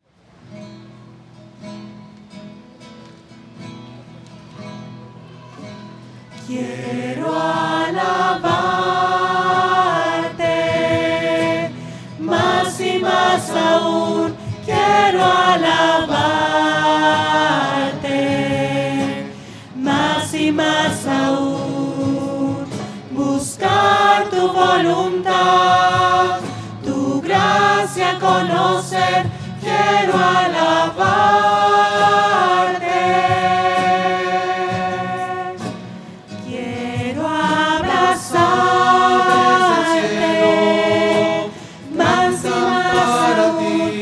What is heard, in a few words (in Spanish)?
voces; misa